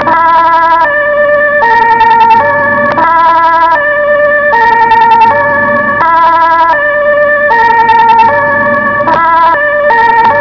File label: Ambulance